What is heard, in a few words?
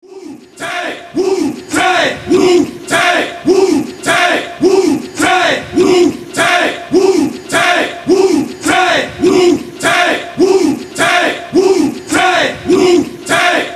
chant acap loop 104; clean